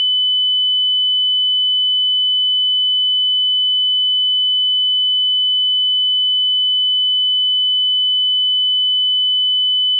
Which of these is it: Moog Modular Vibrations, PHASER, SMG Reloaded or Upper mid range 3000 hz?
Upper mid range 3000 hz